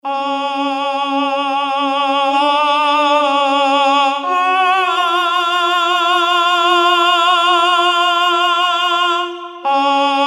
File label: tenor